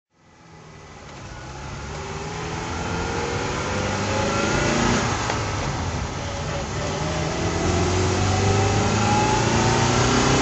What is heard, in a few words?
lancia 2000 coupe drive; Lancia 2000 Coupe, 1972